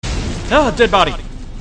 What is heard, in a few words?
Ahh; Dead body